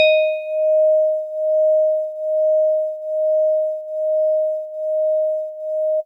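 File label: Jazzy Organ DX100 D#5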